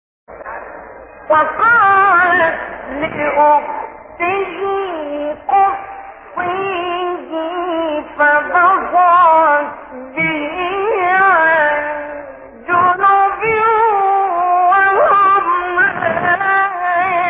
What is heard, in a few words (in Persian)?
تلاوت جذاب از آیه سوره; استاد مصطفی اسماعیل; قصص